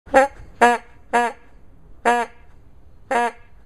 12inch medium loop squeeze horn

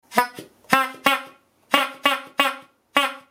straight, squeeze horns

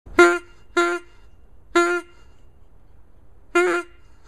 10inch double bell, squeeze horn

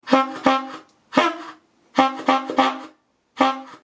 serpentine, squeeze horns

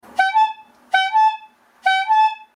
two tone, squeeze horns

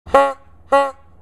16inch nickel plated loop squeeze horn

